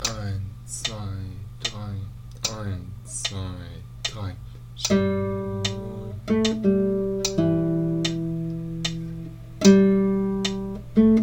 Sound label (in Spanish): guitarra; Stille Nacht heilige Nacht